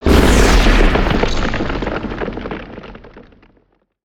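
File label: Earth Tornado End Blast; Spinjitsu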